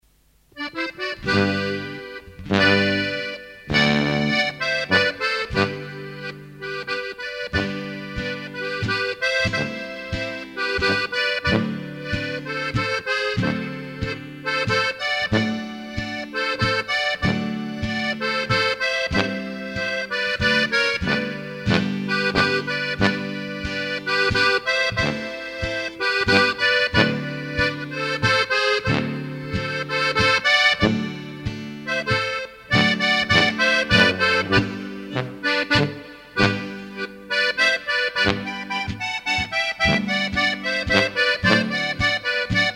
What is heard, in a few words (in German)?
Plattler mit Walzer; Vogelfänger